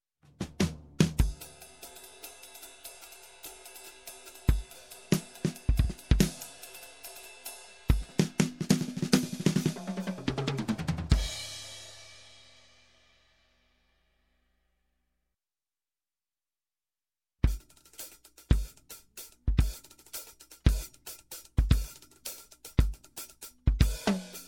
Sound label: Drums jazz Bridge, Music, Percussion; Sound Ideas 2000 Series